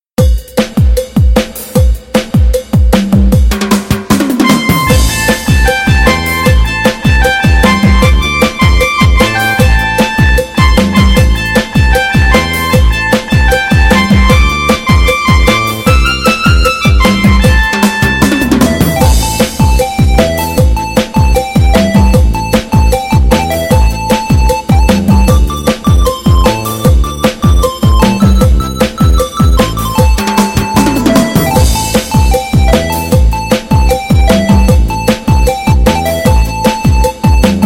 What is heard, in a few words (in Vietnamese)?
Nhac Chuong Nhac Song Khmer Khong Loi